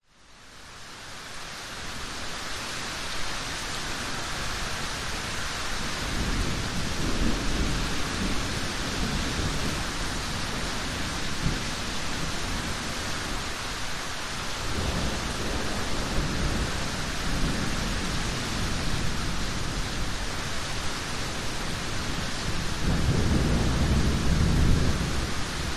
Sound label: thunderstorm close windy driving rain thunder rumbles and light cracks; zapsplat